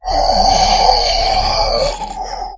death

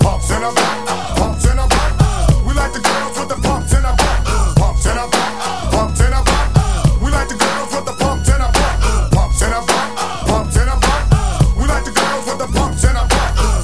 music

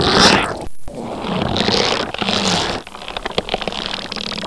got victim, Barnacle; Half-Life

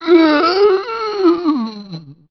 zombie idle, male

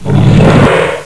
mutant alert

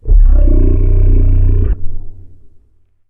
moan; gonarch

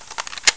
smg reloading

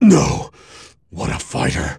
death; pikkon

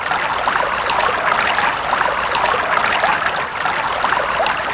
water trickle

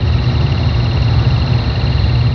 industrial machinery

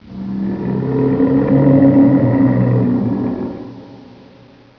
monster

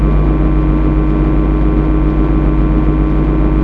gauss move

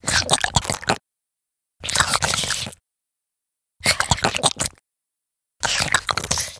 attack slobber, headcrab

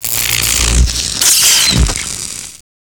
invulnerable off

